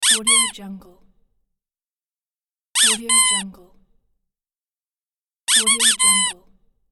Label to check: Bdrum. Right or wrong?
Wrong